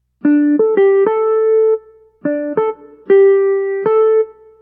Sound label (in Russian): Ритмические вариации; Анатомия Музыки